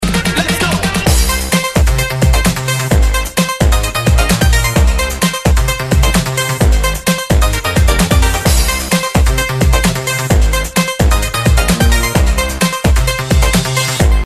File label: Electric